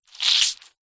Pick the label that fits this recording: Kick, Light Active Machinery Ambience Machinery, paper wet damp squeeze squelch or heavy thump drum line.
paper wet damp squeeze squelch